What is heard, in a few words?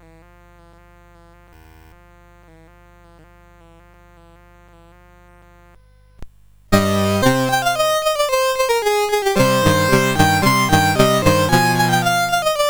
Violin, CSG8580R5